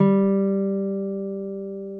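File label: reference pitch uke bari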